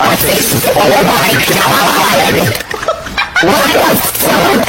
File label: bad mic